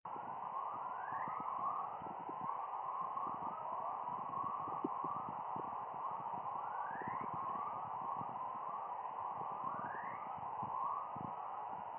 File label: Halley chorus, sounds of space